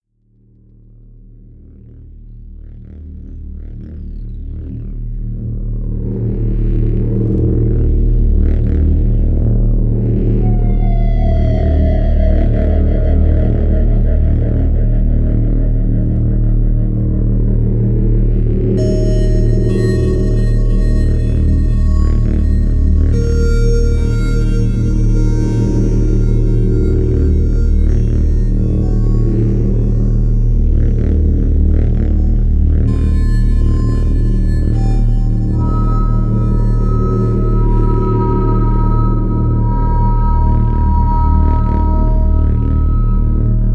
Bunker Ambient; Infra

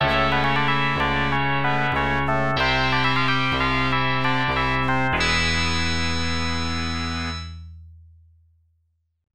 Round I Clear; Tecmo Knight; arcade

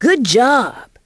Correct

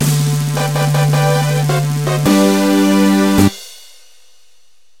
Success; hot rod racer wii u gamerip 2016

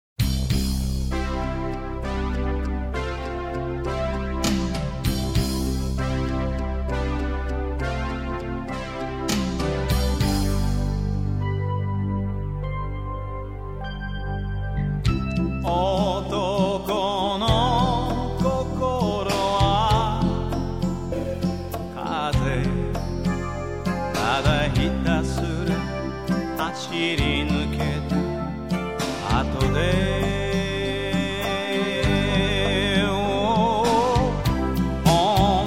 chanson; Hokuto no Ken 2

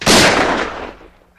rifle shot; Age of War

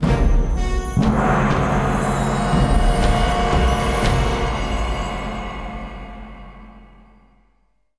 Temple

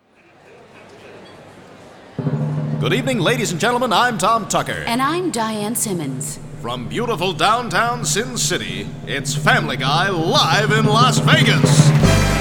fanfare and intro; Family Guy Live in Las Vegas

